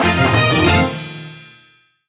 Jingle Secret Get; Star Fox 64 3D

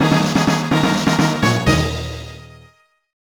Fanfare; Chrono Trigger NDS

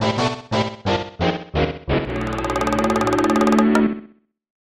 Game Over

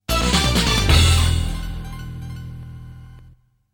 Game Over

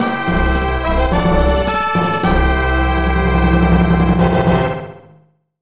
Unused Jingle

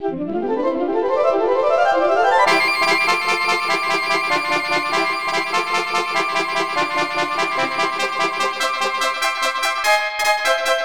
Fanfare; Dragon Quest III

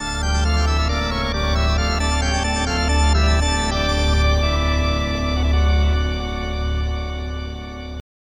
Game Over; Dracula X

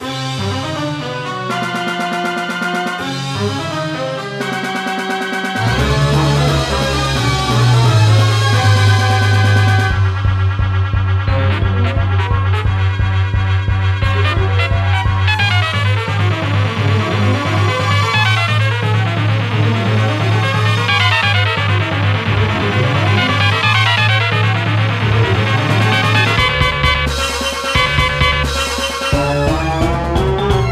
Boss A Tempo Up; Star Fox

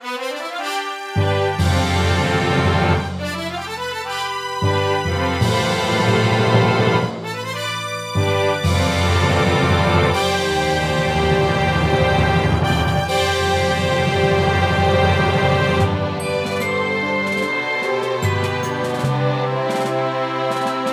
All Clear; Star Fox

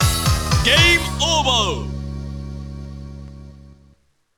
Game Over; rival schools playstation

